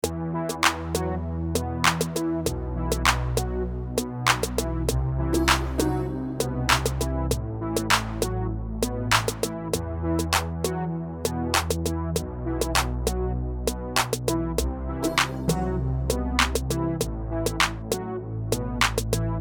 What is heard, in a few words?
Clap